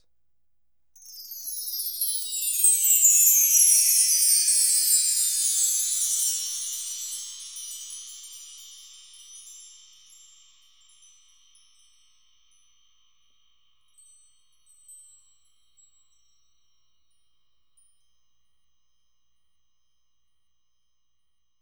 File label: Btree H, BELLS; Roland MV8800